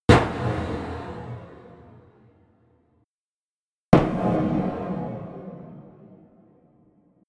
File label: stretched snare, drones